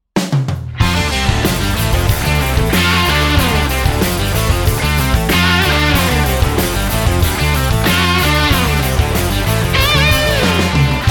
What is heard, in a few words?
RETRO-SONIC BLUES TRAIN